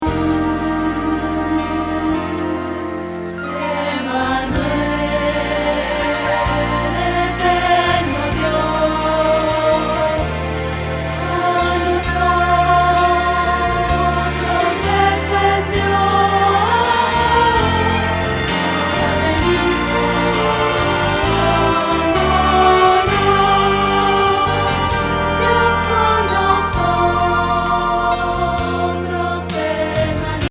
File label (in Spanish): Contralto